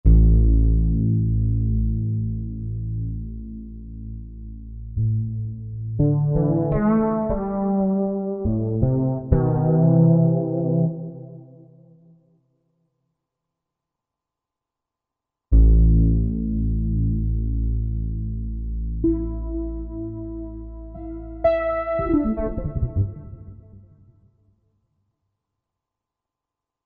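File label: Solo Fretless, Roland SR-JV80-10 Bass & Drums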